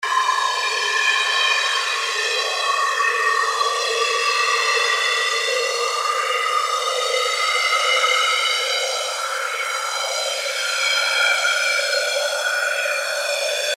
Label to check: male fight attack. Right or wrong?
Wrong